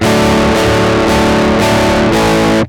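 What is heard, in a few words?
rock chords; A15Mk2; IDMT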